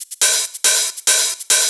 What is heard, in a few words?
Drum Mix, 140 BPM; Pitched; Vengeance Samples; Vocal-Hook Kits; Song Kit; Break The Walls